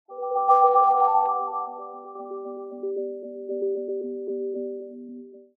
nintendo wii system startup jingle; videogame

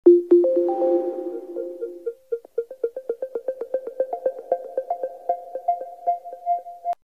nintendo wii u startup jingle; videogame

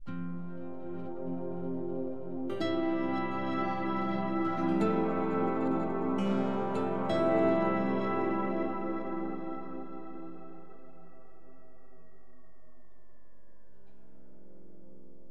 PS4 Startup Jingle; videogame

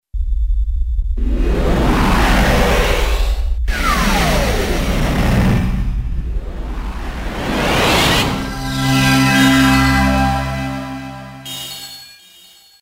Neo-Geo CD; 44khz stereo 16bit

